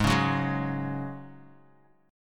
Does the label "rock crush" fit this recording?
No